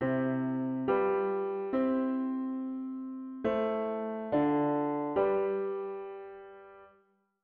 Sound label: diat root w bass